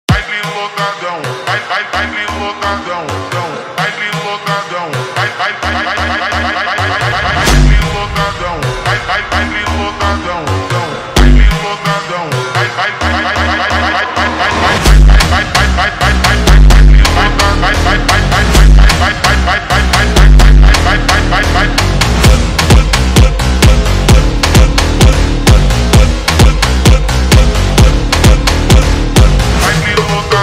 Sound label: Phonk funk